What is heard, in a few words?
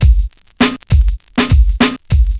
drloop, drumloops, Vinyl Attack; Masterbits